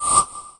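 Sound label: hard sharp button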